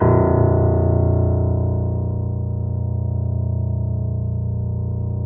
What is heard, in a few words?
PED MF A, GRAND PED; Best Service Advanced Media Trax Modern Composer